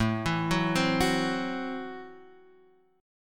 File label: A Minor 6th A Guitar Standard; Am6 arpeggiated; 5,7,4,5,7,x; JGuitar